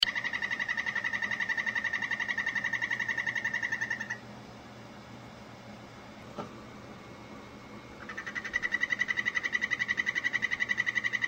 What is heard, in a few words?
p. terribilis